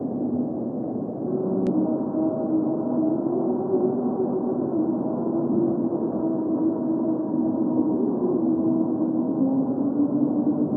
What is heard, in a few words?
melody